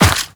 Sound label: Run Dirt, Footsteps; Characters; Warframe; The New War; Kahl